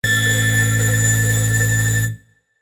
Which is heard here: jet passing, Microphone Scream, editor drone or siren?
siren